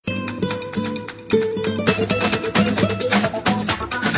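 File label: Bass Guitar Tune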